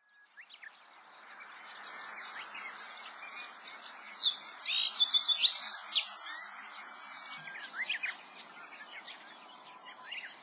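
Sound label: black-faced bunting; aoji; watarase; koe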